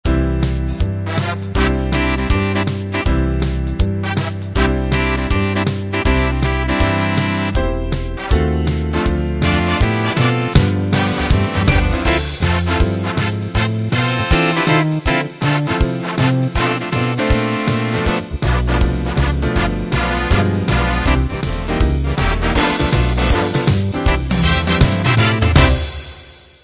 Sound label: broadway